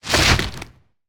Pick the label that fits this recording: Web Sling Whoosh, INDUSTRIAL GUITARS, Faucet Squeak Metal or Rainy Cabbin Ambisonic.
Web Sling Whoosh